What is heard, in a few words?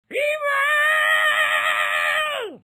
screams; strongbad